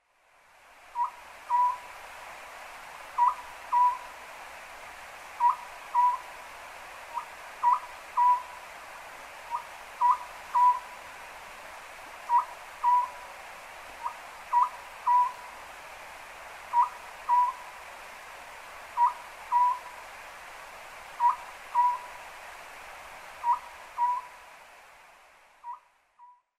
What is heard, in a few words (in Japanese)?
konohazuku, hinoyama, koe